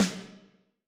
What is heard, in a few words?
Lo Chrome, Alesis SR16